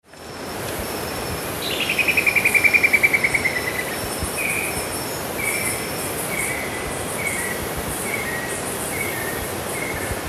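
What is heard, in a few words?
Trepador Gigante, Xiphocolaptes major, Great Rufous Woodcreeper; cantos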